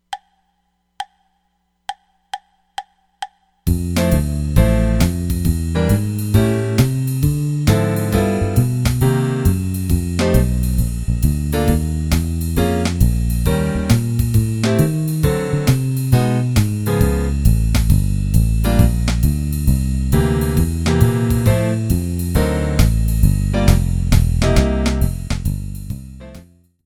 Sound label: jazz blues, E-mu Morpheus; The jazz blues mp3 sound example was recorded with a E-mu Morpheus (Real:Piano), Kurzweil K2000R (Warm Bass), and E-mu Procussion; MyJazzBand